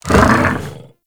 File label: Infested Charger Idle, Enemies; Warframe